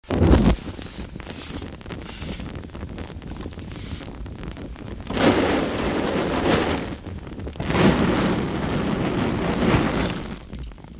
Chemical Flame Flares Up As Wind Blows On Fuel

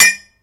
Bullet Shell Copper, shell casings fall

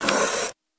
Door Outer Close Dam, dat machine

